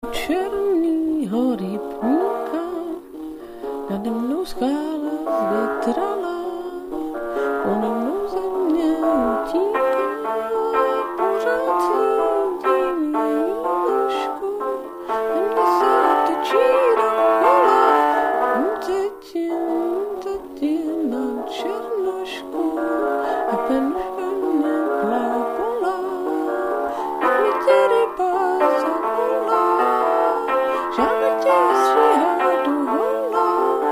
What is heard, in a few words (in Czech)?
chrrr stary klavir